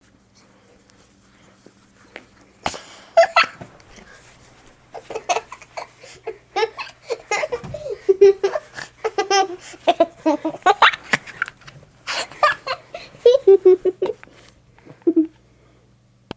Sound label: Child Tickle